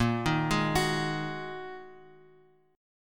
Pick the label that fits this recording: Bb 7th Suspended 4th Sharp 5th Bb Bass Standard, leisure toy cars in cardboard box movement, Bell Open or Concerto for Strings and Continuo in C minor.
Bb 7th Suspended 4th Sharp 5th Bb Bass Standard